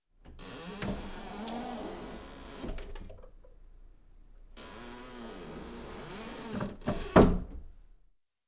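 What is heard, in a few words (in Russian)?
zvuk otkryvajuschejsja i zakryvajuschejsja dveri, door opening and closing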